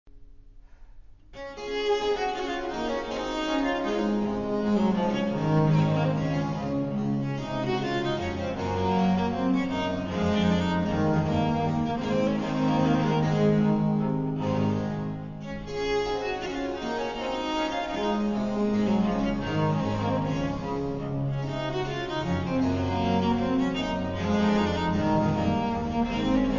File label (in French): Courante; Marais